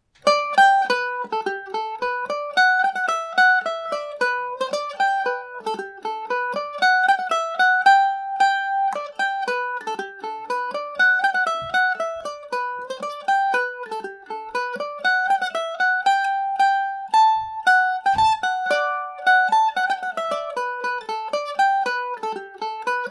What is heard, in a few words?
lisheen polka, mandolin